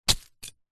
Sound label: warfare knife stab into soil dirt single